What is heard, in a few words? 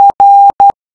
Morse R